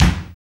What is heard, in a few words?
KIK LIVE BD, drums; AMG; Now CD-ROM (Roland)